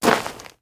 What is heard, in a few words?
Dirt Walk